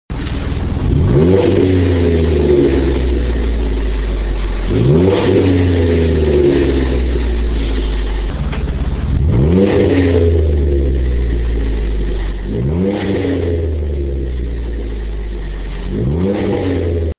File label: Wrangler 4dr 3.8L Swept Side